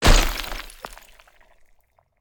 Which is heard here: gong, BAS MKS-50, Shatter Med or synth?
Shatter Med